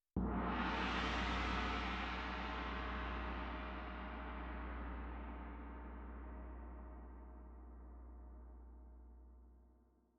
Tam Tam Front

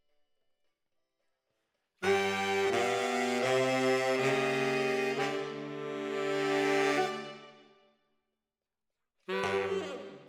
ORTF SCHOEPS, piano

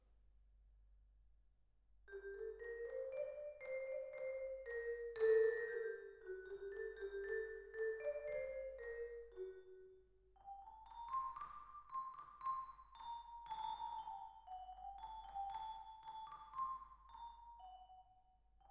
Vibra

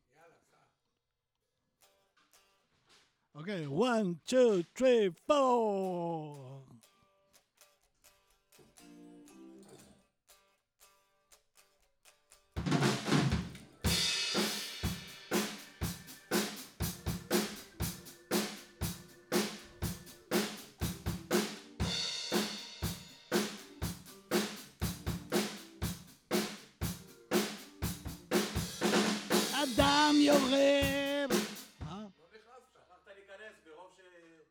VOC

